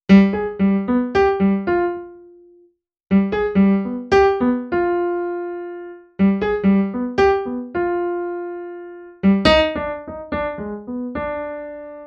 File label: Piano, cinematic funk; Sonic Foundry (Sony Creative Software); Cinematic Funk Urban Tone Poems